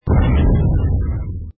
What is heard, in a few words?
audio lose; mahjong ningxia